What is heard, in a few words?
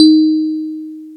DX PIANO